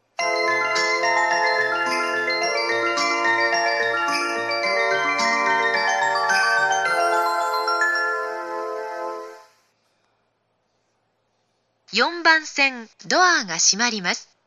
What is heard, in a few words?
shin matsudo melody